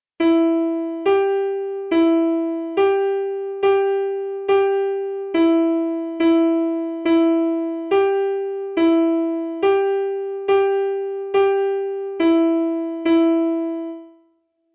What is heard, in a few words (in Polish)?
fortepian rytm; Nagrania Lokomotywy